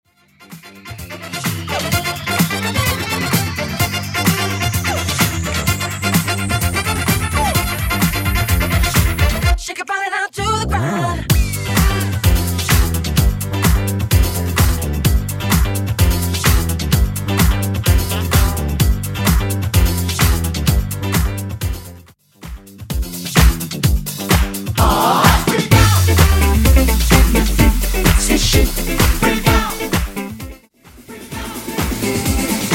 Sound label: Classic House Megamix